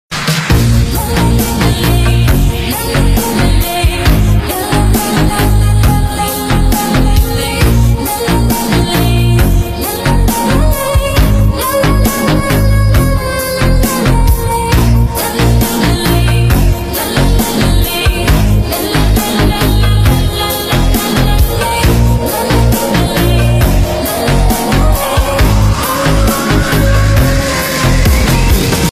remix

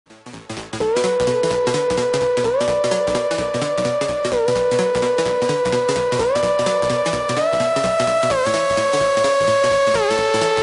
train

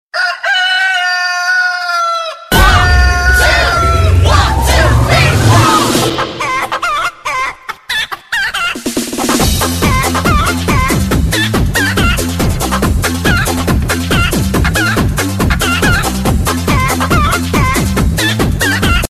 remix chicken, Chicken remix ringtone

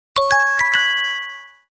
lg dominant

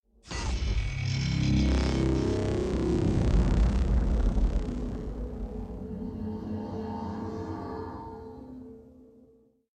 transforme sms

